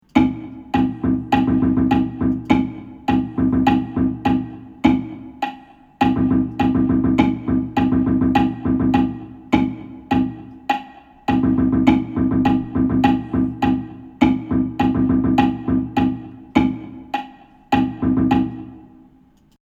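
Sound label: eighth note sixteenth note exercise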